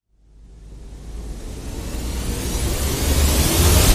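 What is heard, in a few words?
Reverse; Rev Disappearing Act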